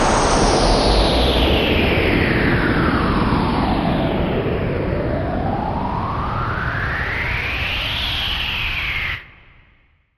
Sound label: Space Fabric Punch